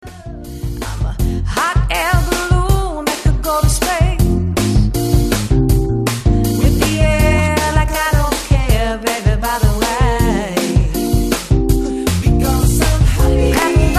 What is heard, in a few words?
Bassline